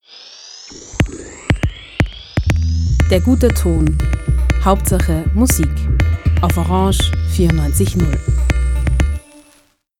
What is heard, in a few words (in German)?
spartenjingle; der gute ton; orange